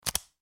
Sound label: Lock Screen, System; i-mobile; ZAA-8-WiFi